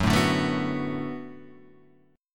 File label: D 6th Fsharp, chord